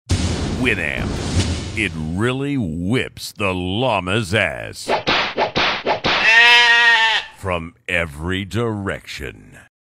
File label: llama